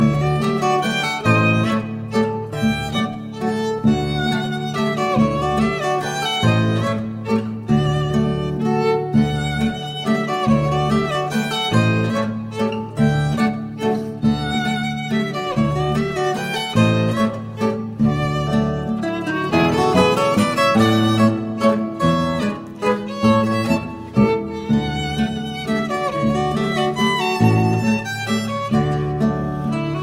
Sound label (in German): Walzer, zither